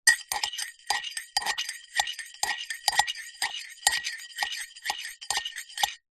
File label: household teaspoon stir empty ceramic cup mug